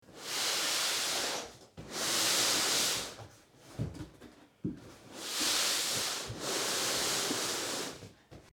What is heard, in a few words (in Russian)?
taskanie po polu, naduvnogo matrasa